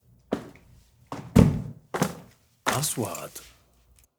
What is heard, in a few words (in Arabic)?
خرخشة معدنية; sound effect